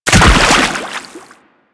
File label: Land Heavy Water, Footsteps; Enemies; Warframe; Teshin; DE